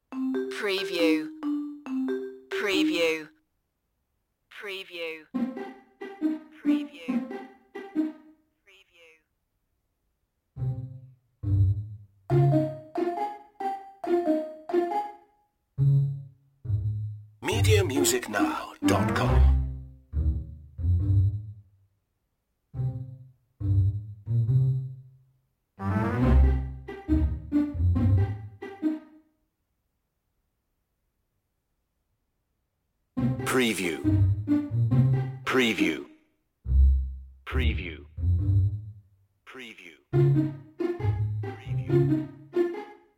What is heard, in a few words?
no percussion